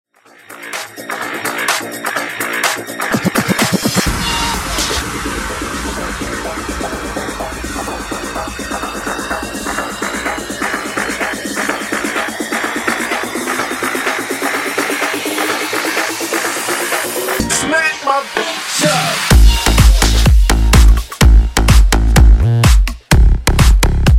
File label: Funk Remix Dirty 126 Bpm